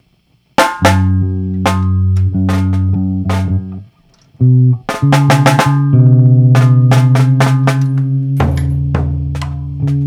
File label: tuning